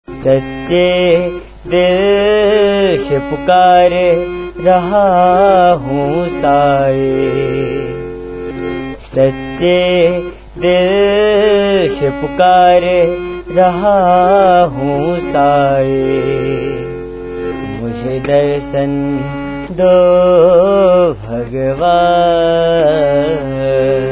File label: Bhajan; SachheDilSe